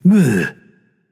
nv tongue; smite; voicepack; hachiman

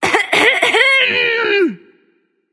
clear throat; smite; mulan

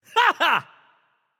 yay; smite; loki

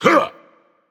grunt hit, dragon; fafnir

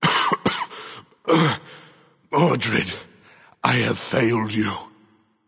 death; smite

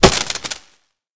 Hit; smite; raijin

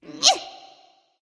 lift grunt; smite; awilix

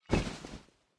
cloth; smite; chaac

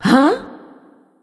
confused; smite; nox

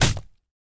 fire impact; smite; poseidon

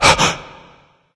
surprised; cupid

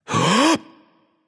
nv breath, vox; smite; voicepack; vulcan

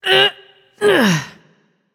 grunt lift; smite; voicepack; hera

